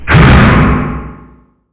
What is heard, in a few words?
robot punch